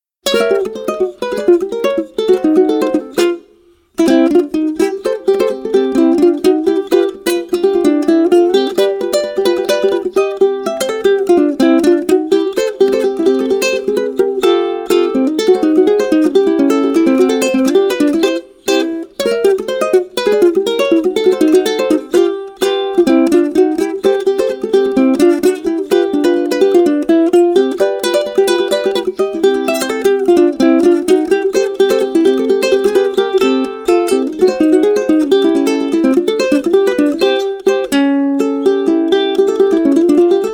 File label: Ukulele; Scrabble